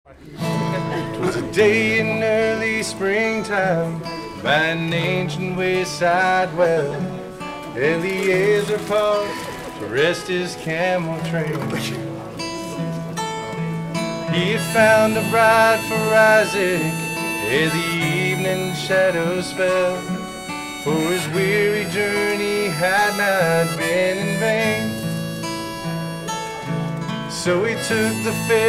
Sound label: bass